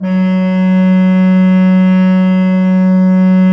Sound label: HGRP F2, Cathedral Organ, HOLZGEDACKT; Propeller Island